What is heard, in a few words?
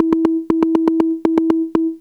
arp seq 120; AMG - Vince Clarke Lucky Bastard